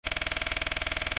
GAMBLE CARD BLINK; Golden Sevens DX

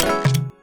turbo on; buttons; Xing Yun Jin Chan

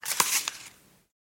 risk win swap; Lucky Fortune; Wazdan

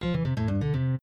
roll start fast; games; Beach Party; wazdan

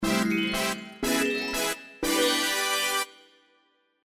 freegame pre trigger; Fortune God

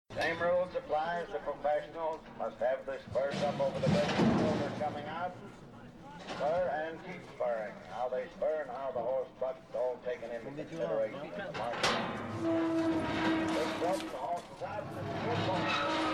ambient; Wild Jack

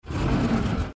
intro door slide open; Four Beauties

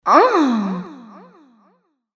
moan; Imperial Girls

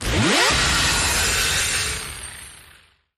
compas x2; In The Forest; Wazdan

